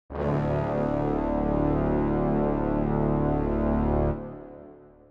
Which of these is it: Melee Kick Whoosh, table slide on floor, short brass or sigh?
short brass